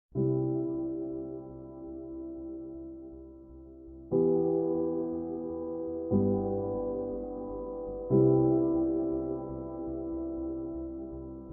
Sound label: progressive house